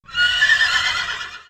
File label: horse attack; fauna